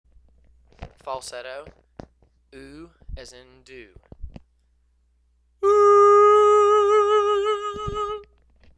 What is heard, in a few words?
Falsetto OO